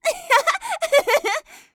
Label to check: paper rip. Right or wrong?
Wrong